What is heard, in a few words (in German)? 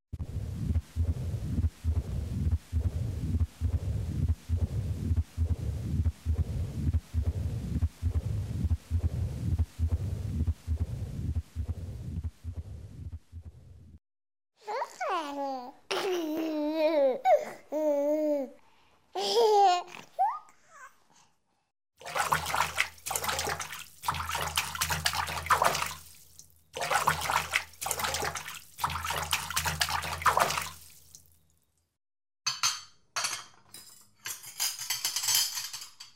Altersangepasste Geraeusche